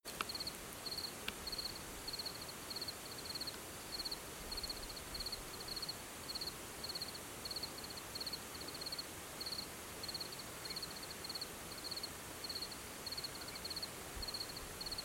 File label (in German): Feldgrille; Zochauer Heideturm